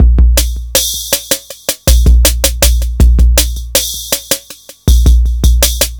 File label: Smooth, R&B Drums